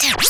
Vinyl FX and Scratches, FX Scratch

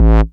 Bass Multi Rev A; Vengeance Samples

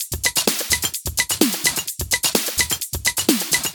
Minimal Loops

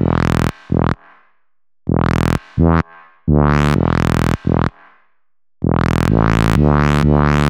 Melody 128 BPM Root E; Vengeance Samples; Melody Kits

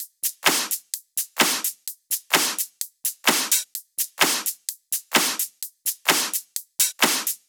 128BPM Drums; Uno; Vengeance Samples; Uno Kit

